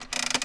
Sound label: crank; Five Nights at Freddy's; FNAF 3